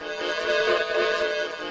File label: buzzy ambient loop; LOOPING AMBIENCE